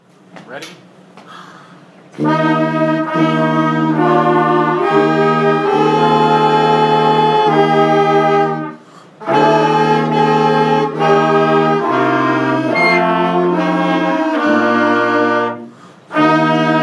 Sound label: chorale, band